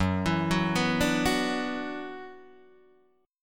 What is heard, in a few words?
Gb 7th Suspended 4th Sharp 5th, Guitar DADGAD; chordshape; down